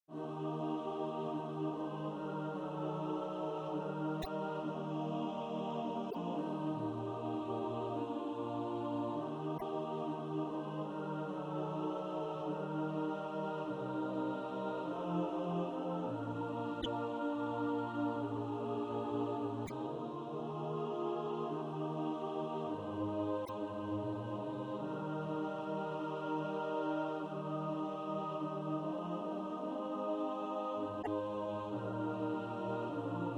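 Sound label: alto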